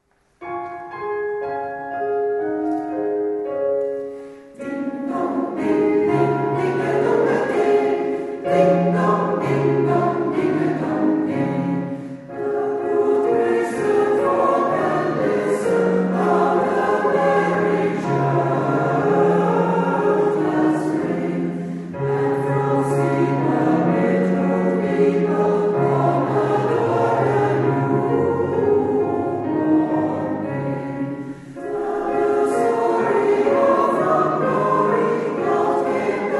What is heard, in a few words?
Ding Dong Ding; Kerst Wilsele